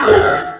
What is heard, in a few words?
boss tank pain; lmctf